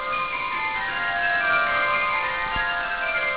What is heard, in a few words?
Campanile